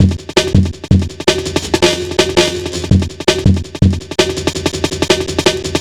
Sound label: Drum Bass, Drumloops, 165bpm; Zero-G; Total Drum Bass